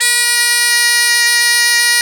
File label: BOMB1 B2, BOMBARDE1, Celtic Flavours; USB Soundscan vol.42; AKAI